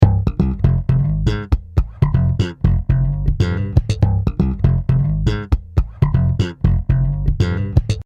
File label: Bass Wet